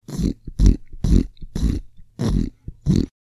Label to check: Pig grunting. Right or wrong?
Right